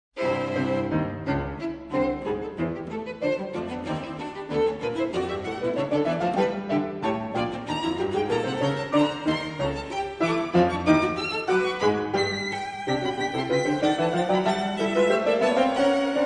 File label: piano violin cello Piano Trio; MARTINU Piano Trio No 1